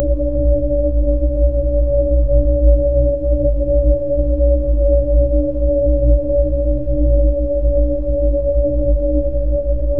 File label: a continuous steady celes; celestial